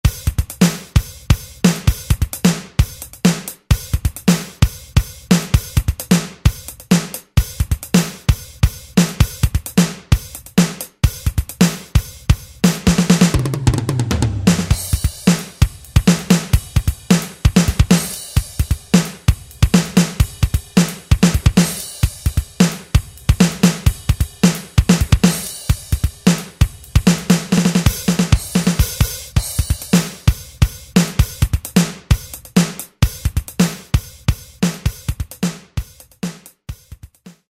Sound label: Fusion, Alesis SR-16, preset patterns